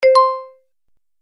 Marimba Pop Up

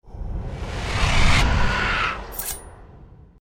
Trample Finish; Goddess of Trampling

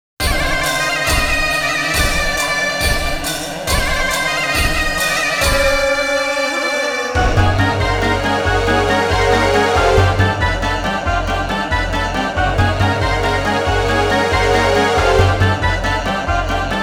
Minigame BGM- Coin Game; Genso Suikoden IV Original Soundtrack

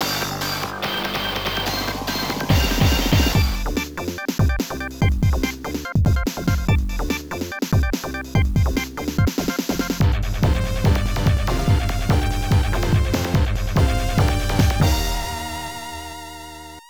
Ending; From TV Animation Slam Dunk Super Slams; arcade; Kainan

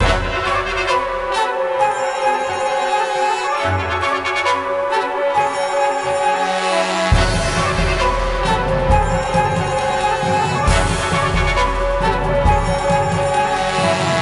Pirate Battle; Lego Battles 2009 NDS

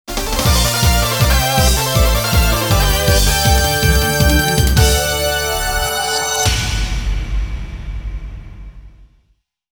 Win (Goal Jingle); Initial D Arcade Stage; sega

